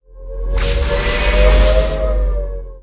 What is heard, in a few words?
spell holy spirit; fighter